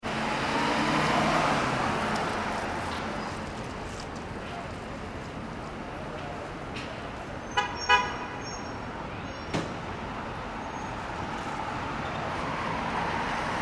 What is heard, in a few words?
ambience urban city nyc lower broadway evening; New York City Street Ambience ( Lower Broadway ) Light Traffic With Very Few Pedestrians; Backgrounds; McKinneySound